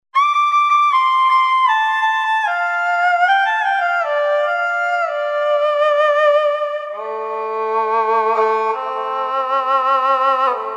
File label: Erhu, v-synth